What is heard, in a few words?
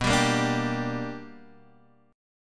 B Minor Major 7th Sharp 5th, Guitar, Drop D